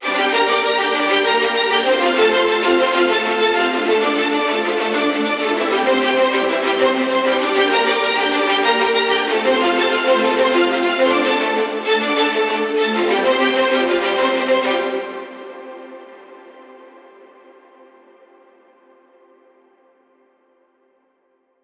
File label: Viola Arpeggio - Dm 130Bpm; Construction Kits; STEMS; Ghosthack; SkyRealm; Kit 24 - Dragon Mountain